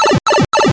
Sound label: Warp